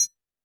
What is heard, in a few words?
percussion, Roland TD-20